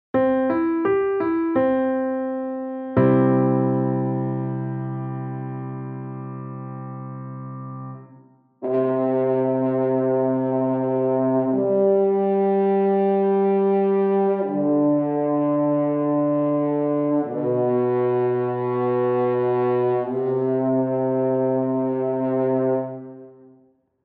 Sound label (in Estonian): Metsasarv